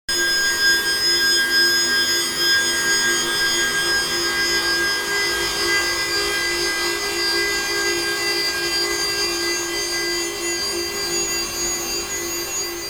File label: silent train